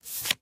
Card; Dr. Driving 2; Android

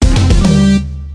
short level won; Tennis in the Face; PS Vita

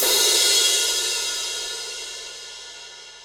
Crash

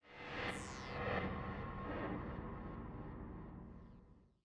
Stinger Holo Off

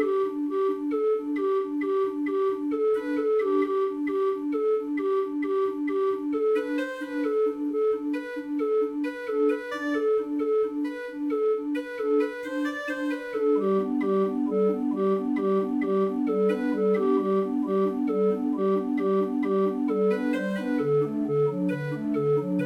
calliope; mountain

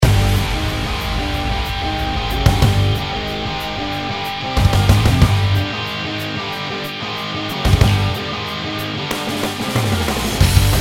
Raid; Mist Train Girls

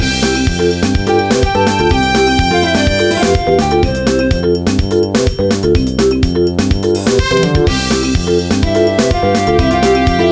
Music Shot Clock; gamerip; summer sports paradise island; wii

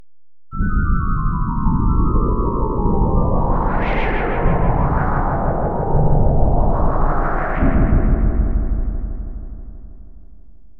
Snes Galaxy Song; Blizzard Arcade Collection; PS4; Xbox Series X/S

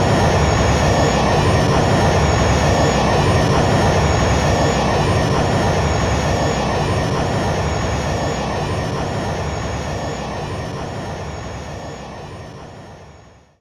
Jet; kids park kaitou sentai lupinranger vs keisatsu sentai patoranger

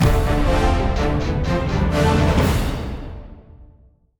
Boss Fight Ends

